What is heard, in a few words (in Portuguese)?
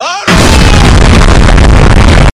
explosao